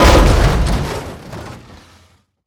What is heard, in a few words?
Veh Hit, Damage; DCS